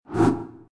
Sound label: stat appear; star-rebellion